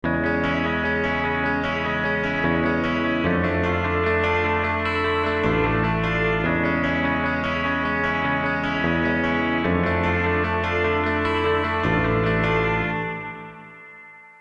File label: solo arpeggio